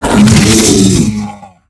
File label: bear attack; npc